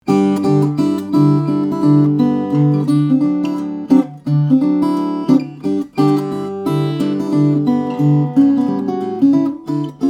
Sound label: steel string guitars, flattop; kronbauer swr